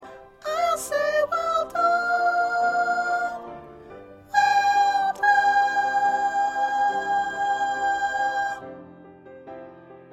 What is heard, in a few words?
Soprano 1